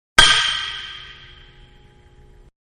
Ice Hockey Puck hitting post; Hockey (Sound Effects:Sports); Manhattan Production Music

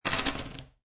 Poker Chips Drop Stack Of Chips On Wood Table; Gambling

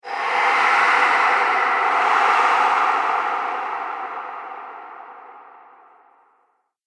Mage Winds Of Change, magic sound effect; Andrew Fleming

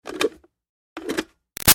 Switch Plastic Bubble Machine On-Off Light; Switches; Objects

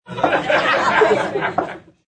Crwd Cckle, A crowd cackles.; Crowd Cackles 1; Laughter

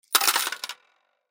Coins Drop In Drawer Rattle Wobble; Money Sound Effects; Objects; Foley

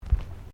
Footsteps Bare feet Tiles Step; Footstep Foley; Human

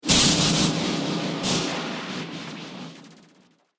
Space Station Collision; science fiction sound effect

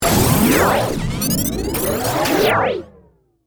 Glitch Warble; Production Element; Glitches (Sound Effects:Sound Design); BlastWave

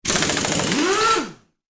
Industry Air Wrench Tighten; Power Tools

